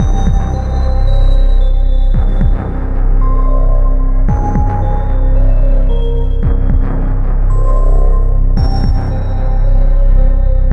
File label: music default ambience